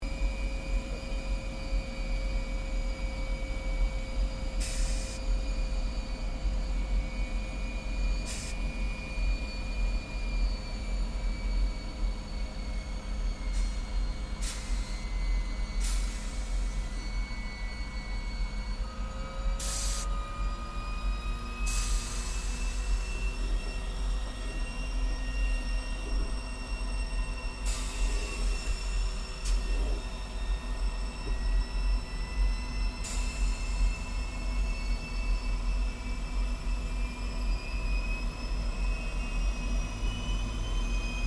Aircraft 1947 Gloster Meteor F Mk8 Taxi Shutdown Interior, Gloster Meteor fighter jet; Airplanes